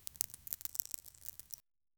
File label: the sound of a magic, crystals